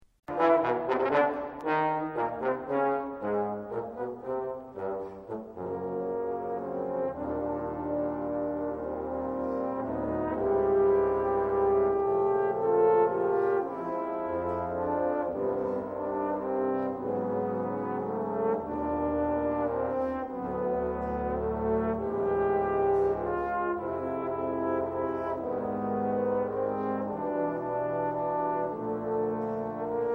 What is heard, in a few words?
trombone song